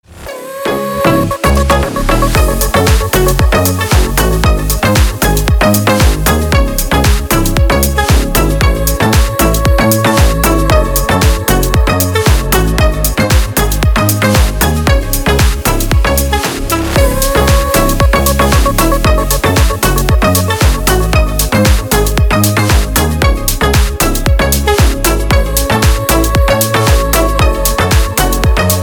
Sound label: call sound